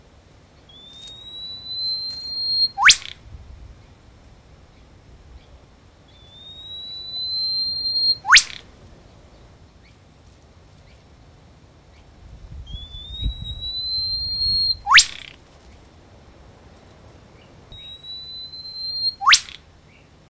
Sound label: wipebird lakes entrance australia